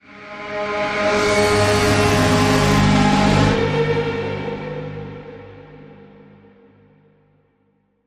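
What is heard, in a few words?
creature; Imperial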